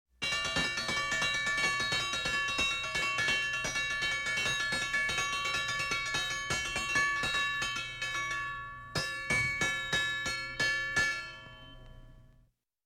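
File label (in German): Bei Tisch Streichholz Anzuenden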